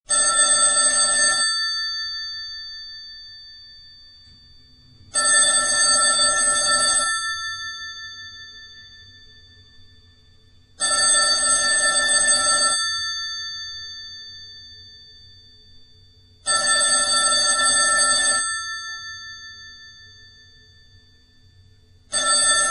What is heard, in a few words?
telephone; 電話; 効果音素材